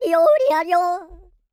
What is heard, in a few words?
YODEL; ILIO; Vocal Planet